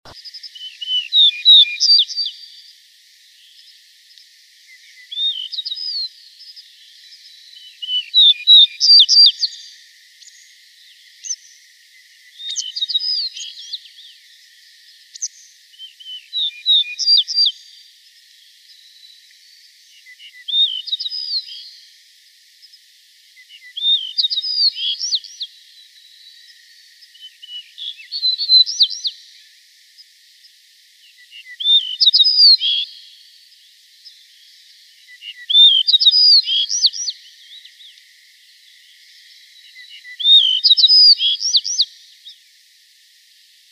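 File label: yellow-breasted bunting voice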